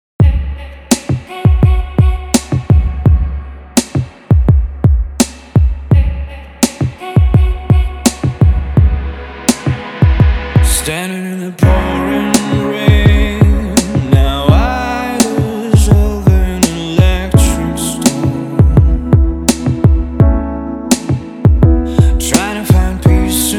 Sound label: Synthpop Indie Pop